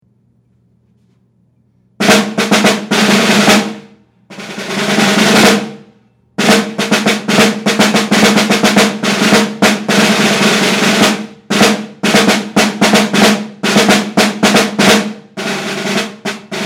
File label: snare drum